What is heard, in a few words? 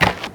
dirt; svencoop